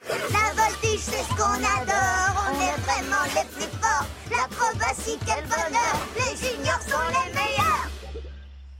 (French); Junior Speedsters Chant; Season 1

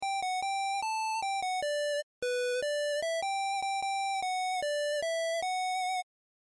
blep; Personal Art

